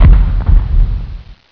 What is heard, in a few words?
fire, cannon; weapon; pillager